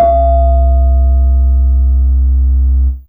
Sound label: Vib Lo C2, Mallets